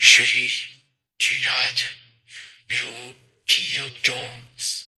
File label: jingle